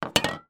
household deodorant can fall over on wood table; zapsplat

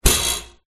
impact metal thin twang

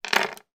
household necklace put down on table

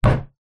impacts wood solid frame; zapsplat

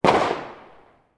firework medium distant explosion; sound effects one

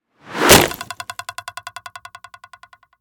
Arrow Impact Wood; Blastwave FX

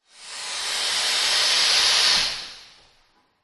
industrial pump air release hiss; zapsplat

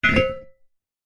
metal heavy chunky item set down on hard floor; foley

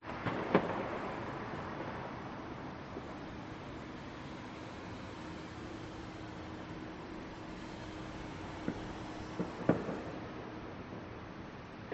BALCONY Daytime Firecrackers Traffic; gain walkers

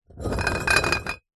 bottles x2 beer full movement scrape on concrete

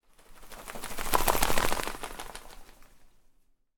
animals bird fly past

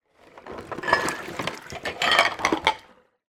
household kitchen sink bowl tip water cups dishes; zapsplat

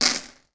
household matches handful drop on surface

